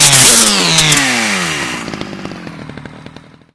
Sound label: die, manhack; hl2